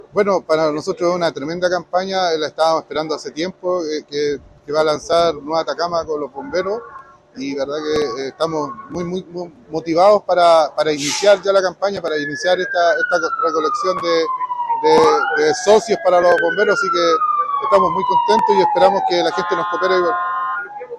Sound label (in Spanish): CAMPANA BOMBEROS; SUPERINTEDENTE MARIO GAETE